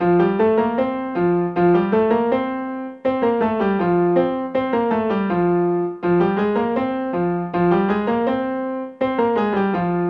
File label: 16th note in F and Fm